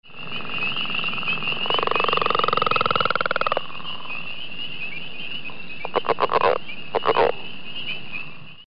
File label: rana pipiens northern leopard frog